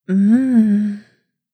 mmm 2 tone sexy; notification sounds

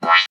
thats nasty; notification sounds

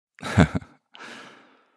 man giggle; giggling; ringtone; voice ringtones

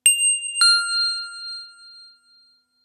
inflicted notification

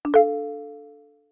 open ended; notification sounds

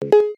served, message tones

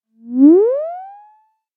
awareness, message tones

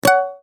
suppressed, notification sounds

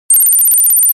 wake up tones

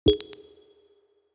what if, notification sounds

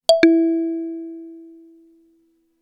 to the point, message tones

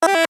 finger push; message tones; finger pushed

